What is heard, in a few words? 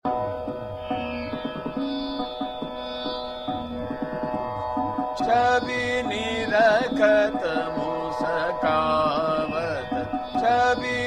Chota Khyal, Ektal Drut; Khambavati; Chabi Nirkhat Muskawat